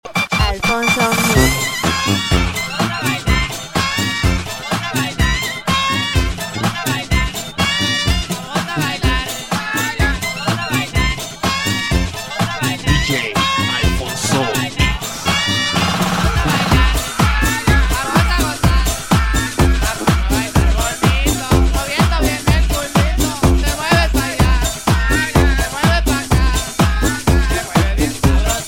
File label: Cumbia